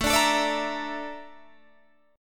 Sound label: Bb Major 7th Flat 5th A